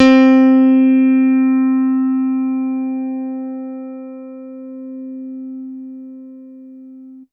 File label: Piano Electric c3